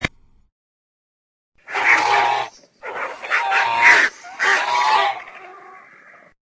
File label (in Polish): Dinozaur; Edek